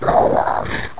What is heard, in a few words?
friendly alert; svencoop; portal house